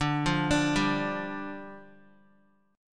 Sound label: D Minor Double Flat 5th, down, Guitar; Dmbb5; arpeggiated; JGuitar